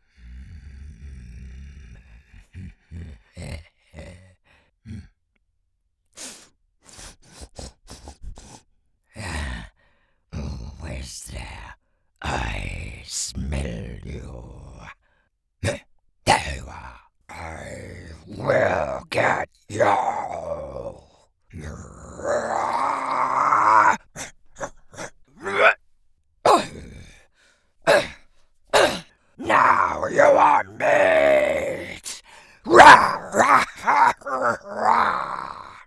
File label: Creature ORC Aggressor, creatures vocal extremes; Creature Demo